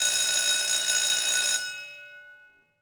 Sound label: firebell; Matt Hoffman's Pro BMX 2; XBOX